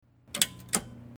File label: page click